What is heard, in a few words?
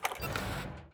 open door; Furcherro